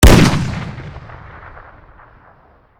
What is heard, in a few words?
fire; black ops 6; iw9; weap india fire npc